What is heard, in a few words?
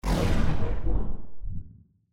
Slow Down; GGJ2022